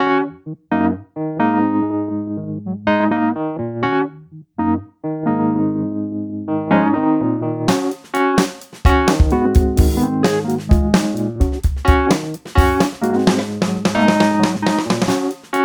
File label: Dungeon Groove; Fear & Hunger